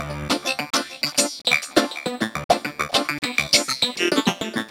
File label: Clav, Funk Keys; Sonic Foundry (Sony Creative Software); Synchro Funk